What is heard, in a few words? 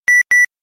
Tettettettet